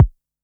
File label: Analog SY1 Kick